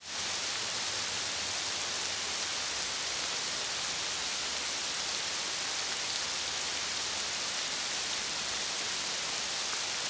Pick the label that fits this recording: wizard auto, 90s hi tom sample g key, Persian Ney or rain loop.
rain loop